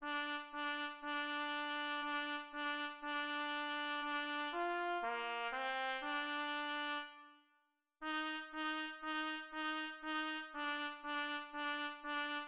jingle bells, trumpet

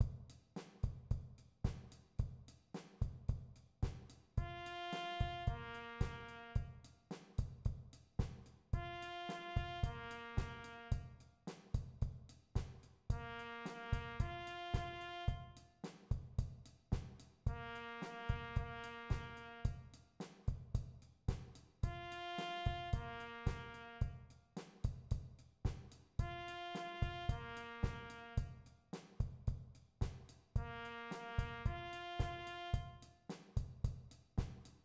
rock beat with trumpet

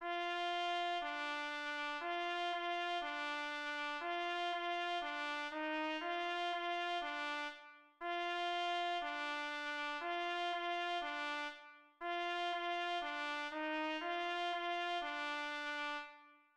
rain rain, trumpet

